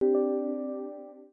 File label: Windows Background